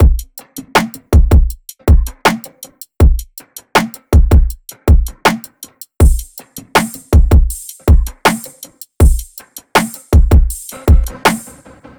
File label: loop full 160; Seismic Loops